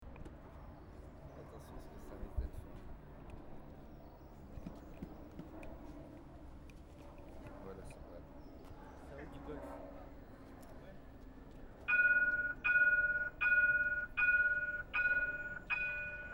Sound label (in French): klaxon bus